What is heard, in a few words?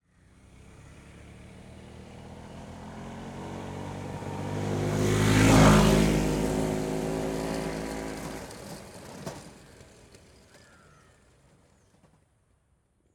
Rajdoot, motorcycle, approach, by, away, fast, rattle, Yamaha Rajdoot 175cc; Bombay Sonic